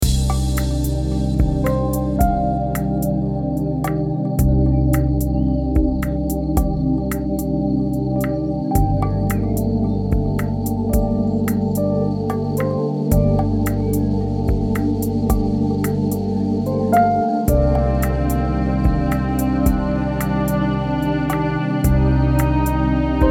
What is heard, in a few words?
110BPM